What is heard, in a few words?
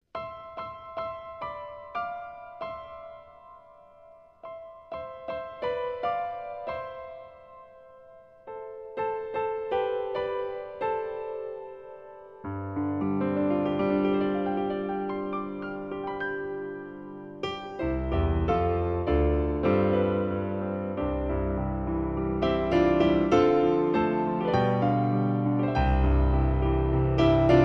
piano